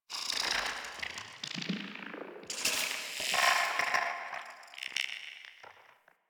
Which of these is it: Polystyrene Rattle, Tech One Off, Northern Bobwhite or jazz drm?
Tech One Off